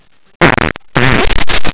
fart

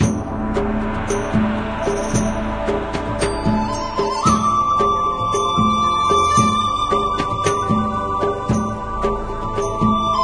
Menu Music

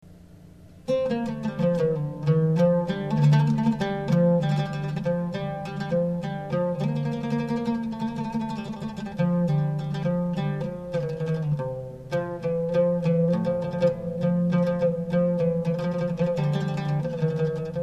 Aoud

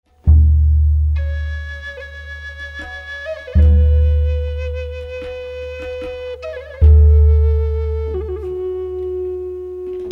flute

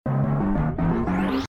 dash

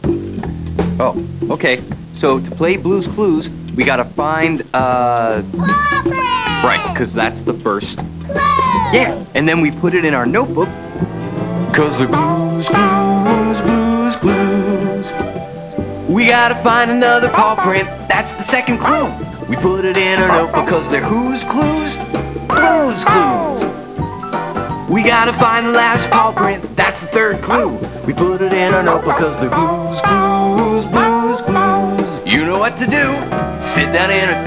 theme song

